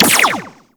Sentinel Burst Laser Pistol Fire; Warframe

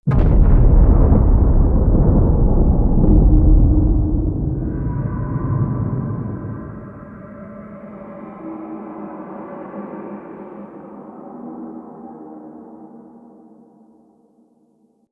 Under City Cave Collapse Rumble

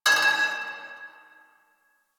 Pipe Cement Drop

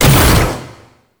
Taller Door Open Mech; Warframe; Entrati

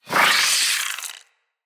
Root Spider Elite Vocal Pain, spider; Animals; Spiders; Vocals

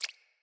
Rat Water Step, Foley

